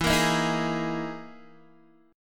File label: E Major 7th Flat 5th E down Guitar DADGAD